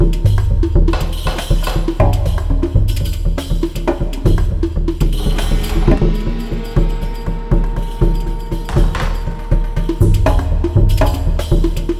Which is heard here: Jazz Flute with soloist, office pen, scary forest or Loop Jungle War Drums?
Loop Jungle War Drums